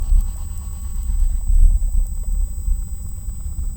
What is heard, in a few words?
Hydrophone